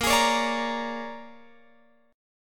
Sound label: Bb 7th Sharp 9th Bb, Guitar Open F; Bb7#9 strummed